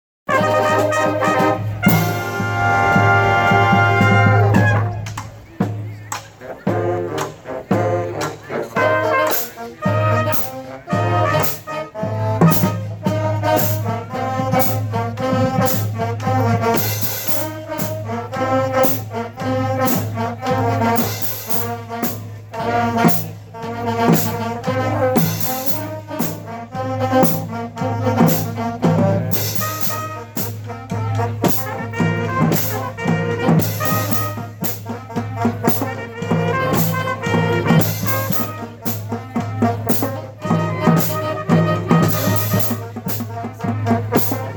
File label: Band